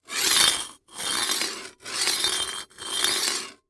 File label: Industry Metal Sawing Slow